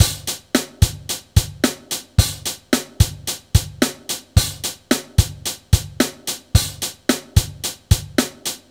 Rock loop; Reference Beat

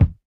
Kick; Reference beat bpm95; urban grooves; Rock

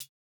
no effect j city pop 1980 hihat

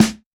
snare samples, Rock

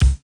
kick, house

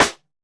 Hiphop snare; Dry original

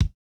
no effect j city pop 1980 kick

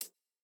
Jrock hihat

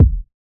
Hiphop kick; Reverb+EQ+Comp+Limit

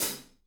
hihat, house

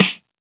90s hiphop snare; Reference beat bpm90

